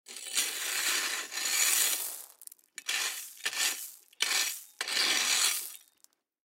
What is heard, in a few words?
Rake Aluminum, Rake Aluminum Metal On Gravel; Yard Tools; Household; Blastwave